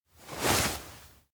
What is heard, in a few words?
bow head; ken; anemoi